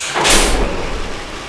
Subway move slow start

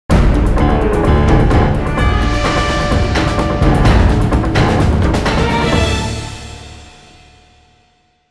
Fight Win, music; The Da Vinci Code; XBOX

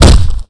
bail body punch; skater; Tony Hawk's Project 8; XBOX

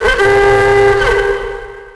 Alarm broken

